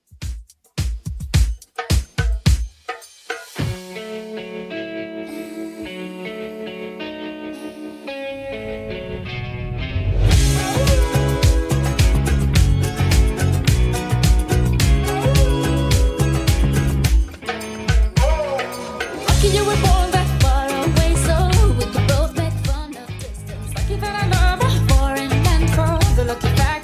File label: 2ks Redrum 107bpm